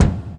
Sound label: BD OPEN; E-Lab; X Static Goldmine 2; akai